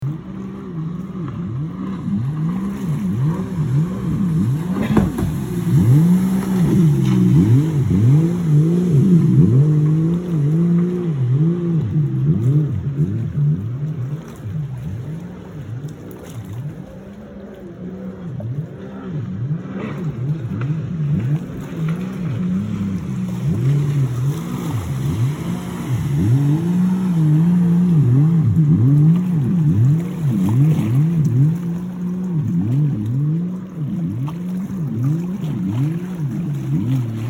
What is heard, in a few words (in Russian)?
gidrocykl, zvuk gidrocykla